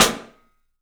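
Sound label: PICOLO SNRS, snare, drums; 3D Sound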